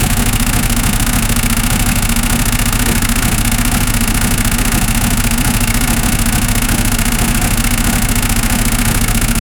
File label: a formula 1 engine sound